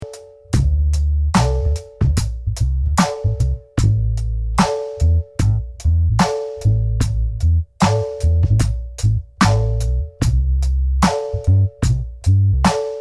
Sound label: Filter freak Kick n On Groove